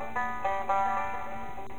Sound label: banjo